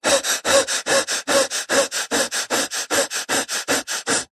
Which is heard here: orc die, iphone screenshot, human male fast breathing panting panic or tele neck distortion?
human male fast breathing panting panic